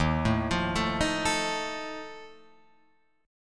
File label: Eb Suspended 2nd Flat 5th, Guitar, Open F; arpeggiated; chord; 3,4,5,4,6,4; JGuitar